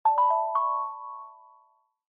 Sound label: Smartphone UI Sound Speaker